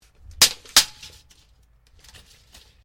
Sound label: TAPE MEASURE METAL MOVEMENT, Common metal tape measure; Hand Tools; Sound Effects:Tools